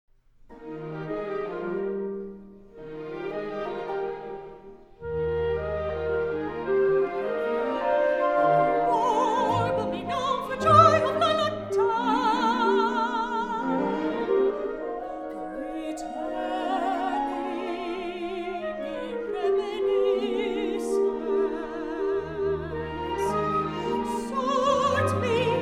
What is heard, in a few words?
orch